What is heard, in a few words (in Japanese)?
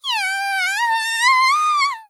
きゃああああ！; ハイグレボイス集; 姉